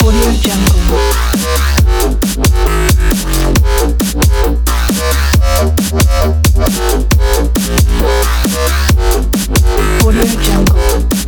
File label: breaks loop